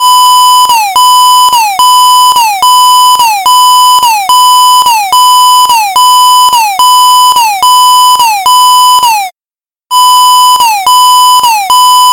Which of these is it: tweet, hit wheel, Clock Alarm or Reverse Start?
Clock Alarm